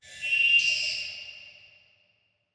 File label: Grabber Scream Distance; Deep Rock Galactic Boardgame